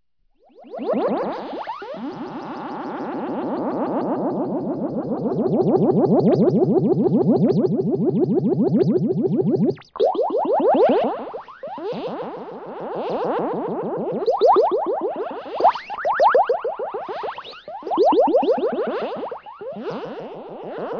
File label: Alien control room